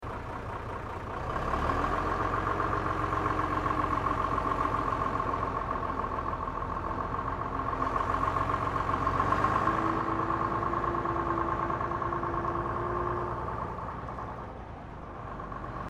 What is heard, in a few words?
bus double decker bristol vr drive off